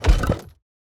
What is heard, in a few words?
environment building barrier place